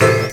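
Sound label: PIANO loop; USB Soundscan vol.27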